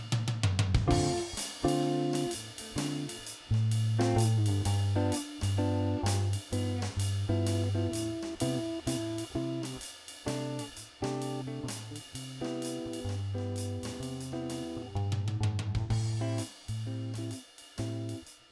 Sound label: sample ii V I